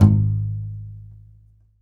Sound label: C. Bass Balalaika, Plucked Strings